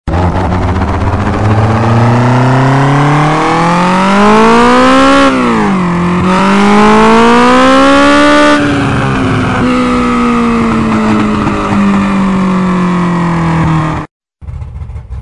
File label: Offroad Vehicles